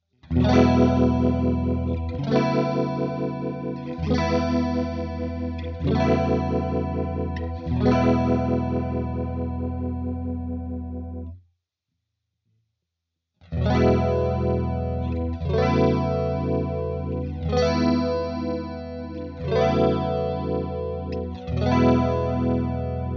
vibe

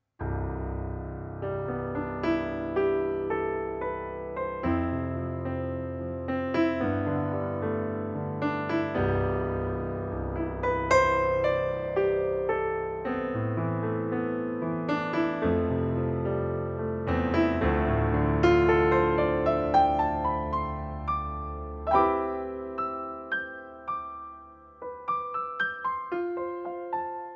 Piano

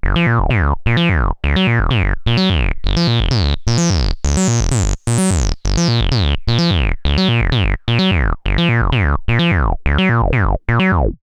Liquid Foam Loop; HerbsAndStones

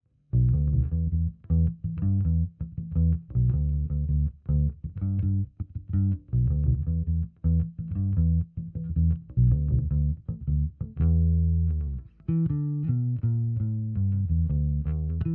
Flats SVT410E sm57

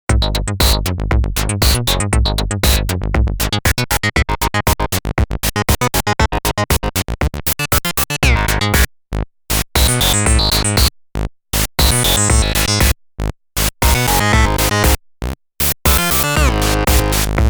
Sequential Pro 3

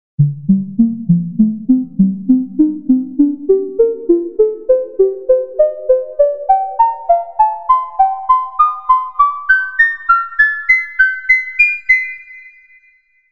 VCF A Selfoscillation; Marienberg

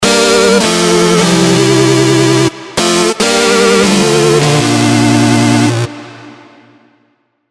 Dubstep Electro Happy Lead; Nexus 3; Dubstep Electro 4

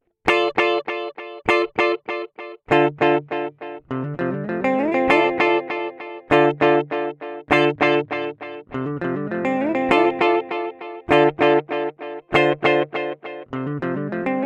Boss DD 200 Drum

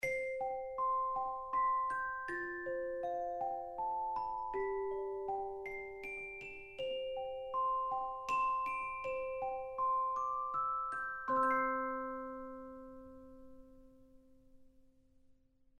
Celesta Sus

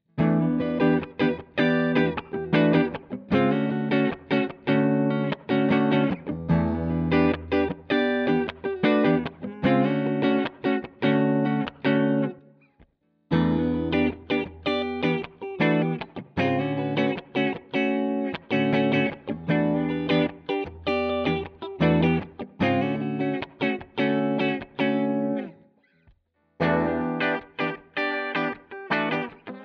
Epiphone Les Paul Neck Both Bridge Clean

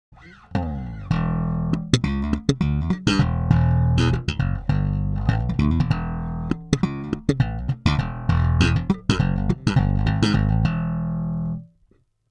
Jbass slap neutral; HB Block 800b

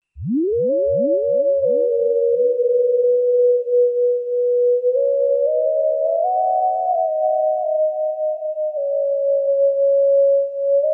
moog theremini etheral mit half pc mixolydisch

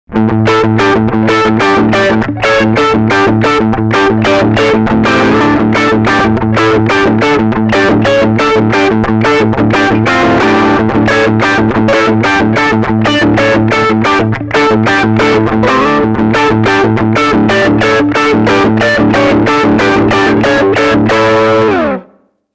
Ambient Crunch

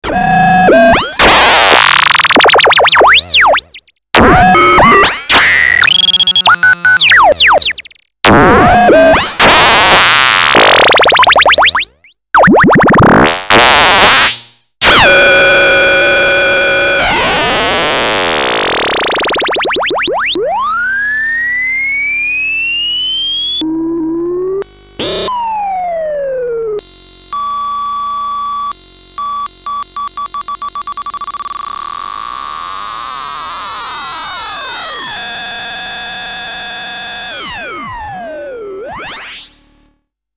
LFO speed

